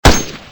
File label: firearm mac10; mace